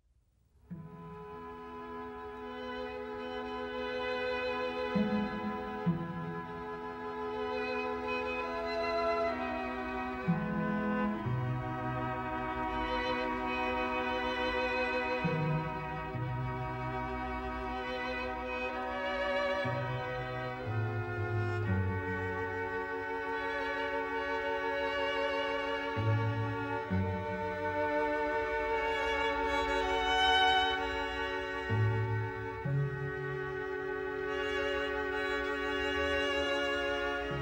Adagio from String Quintet in C; Schubert

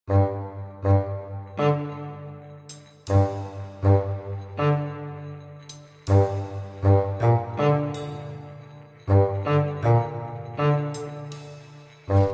Steampunk Music

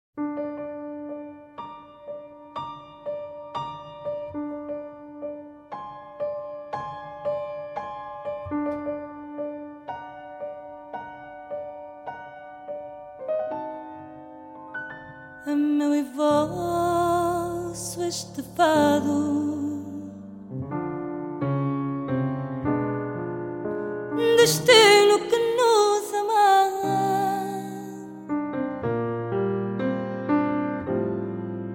piano

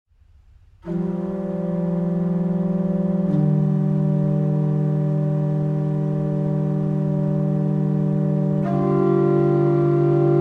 Organ; No Sun to Burn

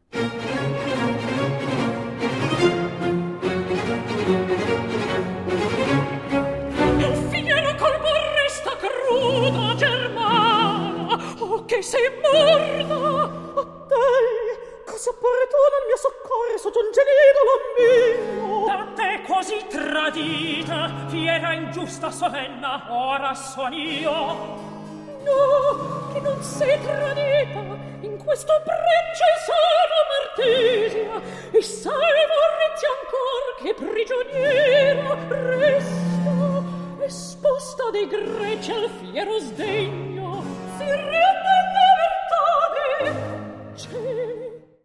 Recitativo; Ippolita, Teseo, Antiope, Orizia

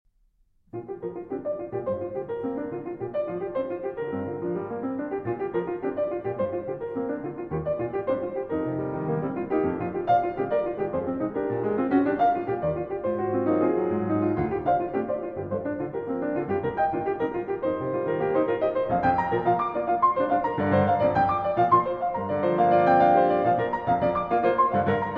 Concert Etude, Pastorale Allegro Moderato; Eight Concert Etudes, Op. 40 VI.

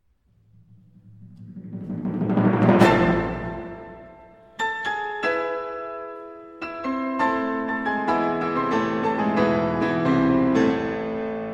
Piano Concerto in A Minor I. Allegro; Op. 16; Grieg

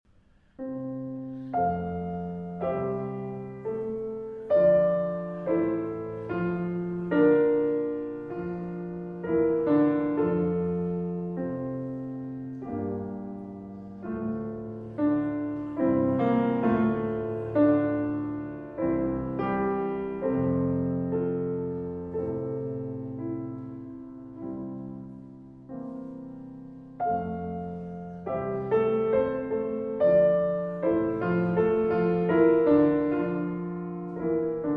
Piano Pieces Romance. Andante; 6 Piano Pieces, Op. 118; Brahms; Allegretto grazio

